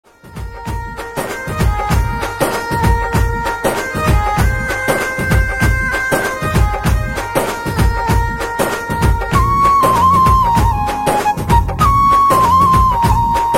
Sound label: Flute Ringtone